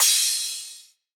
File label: Hard Crash; trap; Cymbals; Cymatics; Trap Starter Pack